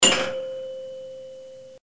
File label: Bell